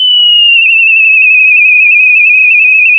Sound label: pop, sound of a tea kettle whistling